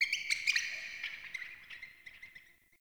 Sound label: Under City Alley Critter